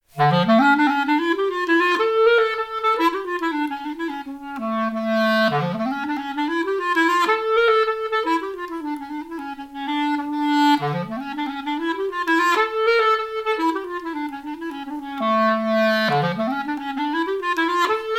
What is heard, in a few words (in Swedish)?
slangpolska